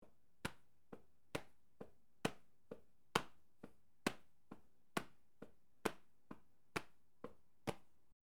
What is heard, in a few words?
Polyrhythm Hand and Feet; Jamuary